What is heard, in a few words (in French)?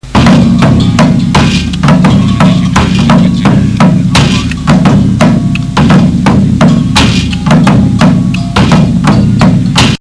dun dun, tambour créole, Percussions en argile